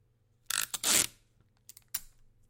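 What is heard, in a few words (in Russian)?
клейкая лента скотч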